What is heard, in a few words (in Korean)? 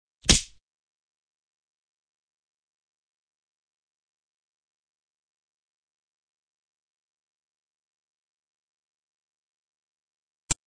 Fights, 싸움-전투; 헐리웃특수효과음